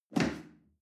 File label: chair wood take hard